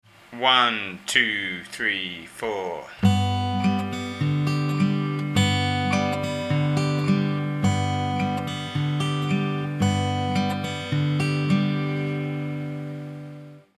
travis G, guitar

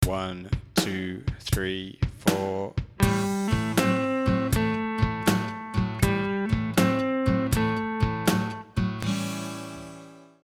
blues a hammer, guitar

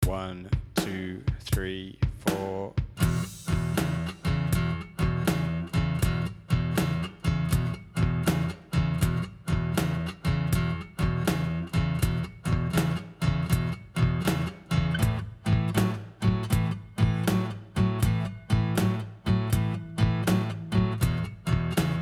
blues spread, guitar; bluese spread

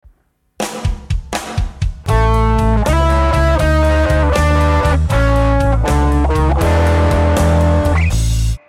blues turnaround lick, guitar

